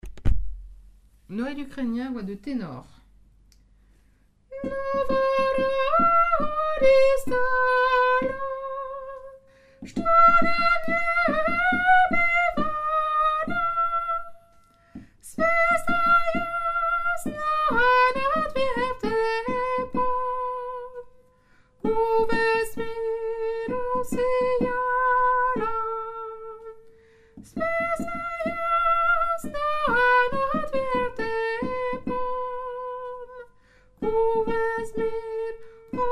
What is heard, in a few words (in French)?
tenor; noel ukrainien